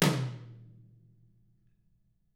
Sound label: DW HIT, Drums; DW KIT1